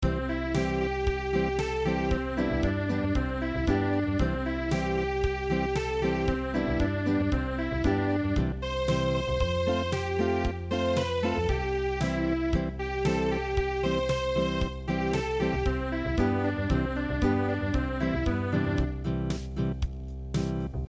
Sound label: Unplugged; Qui Kwai mani mani